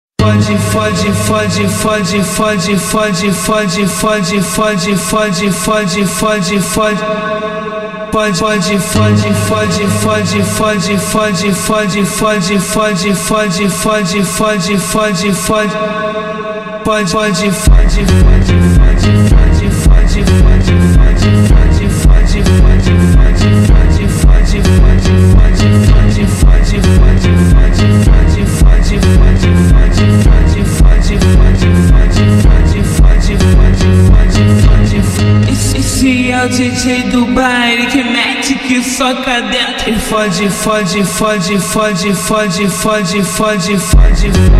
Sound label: automotivo slowed reverb